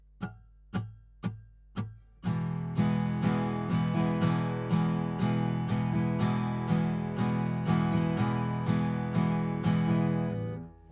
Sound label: Strum 120bpm